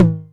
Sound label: Tom Hi, Novation Drumstation 909